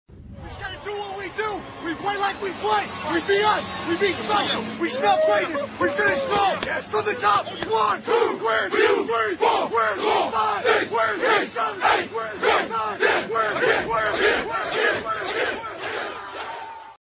huddle chant

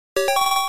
arcade credit; rolling thunder

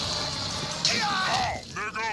kick

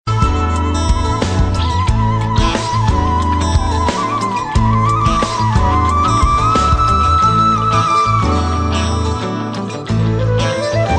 flute melody mix, jazz; ringtones

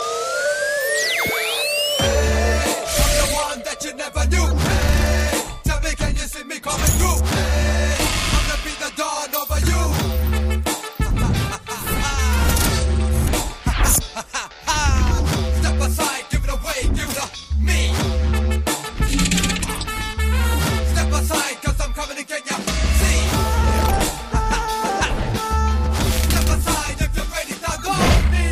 dj hip hop beats

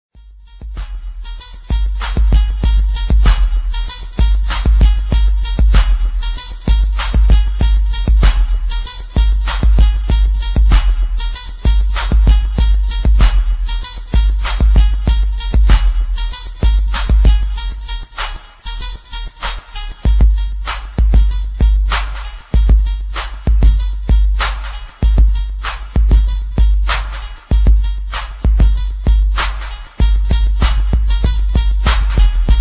instrumental

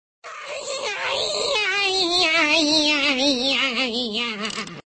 laughs

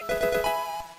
Level Up

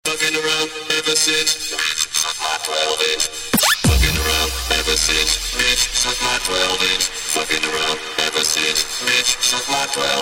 Remix BASS

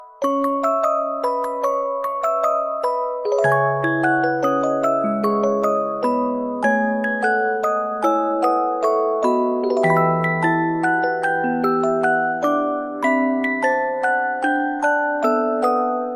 Music Box; SNK

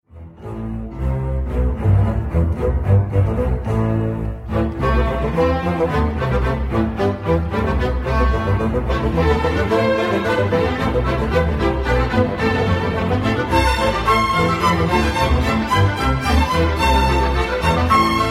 NFL Footage Music, ringtones

